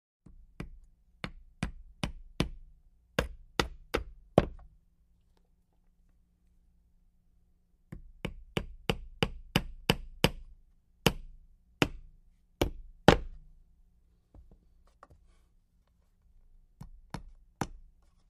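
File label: Hammer Soft Hits Nail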